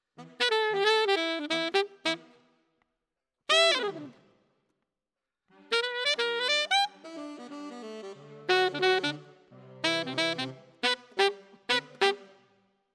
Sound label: Alto, RNR for piano